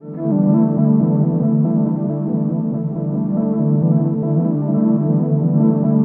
ATM SYNTH; Best Service - XXL Production Effects

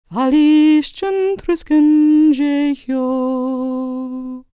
GAELIC, World Voices, GAELIC FEM

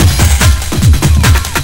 SPEED, Big Beat Drumloops

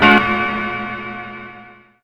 Piano, Underground Hip Hop; USB Soundscan vol.02; AKAI

